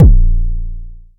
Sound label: analog tonal, Kickbank